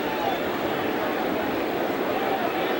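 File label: baseball crowd ambience loop; SFX Kit; Crowds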